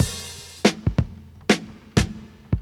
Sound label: stuttered man, drum loops, funky